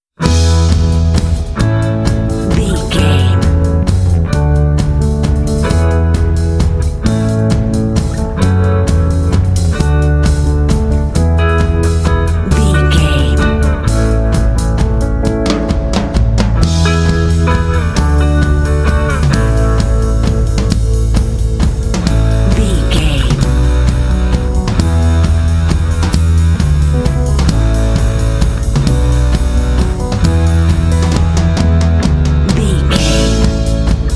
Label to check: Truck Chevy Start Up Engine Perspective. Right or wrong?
Wrong